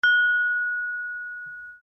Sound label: Samsung Galaxy A5; Samsung Galaxy A5 Official